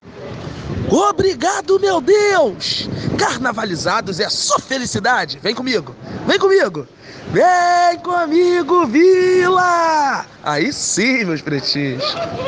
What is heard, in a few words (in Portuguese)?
grito